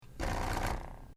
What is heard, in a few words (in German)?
Sony Alpha 7 Mark II Tonaufnahme der Sensorreinigung